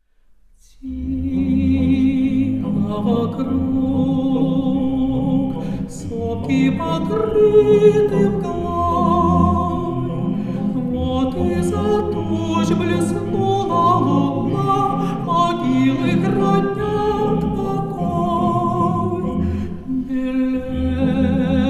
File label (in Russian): Хор